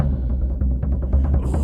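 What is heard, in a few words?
Music Shield; from dust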